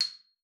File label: C7, xylophone; Xsample VOL-4